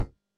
land, character voices

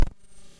roll dodge; Movement

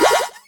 double jump; Movement

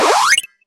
teleport; Magic

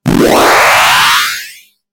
warp drive; Sci-Fi; freepixel

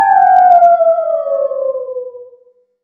wolves distant; Horror

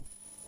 hit blunt; Combat; combat impacts

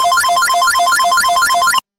coin rain, platformer

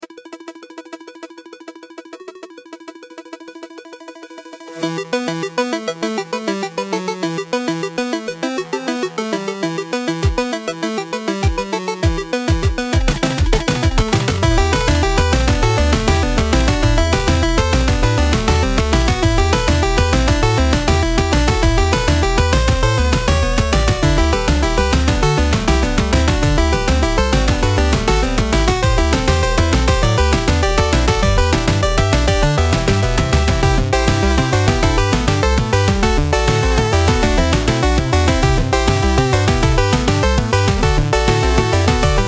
battle tactical; freepixel

